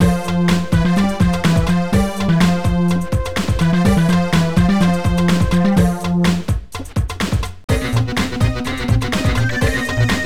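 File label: background music level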